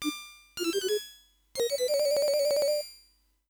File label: ditty; spathi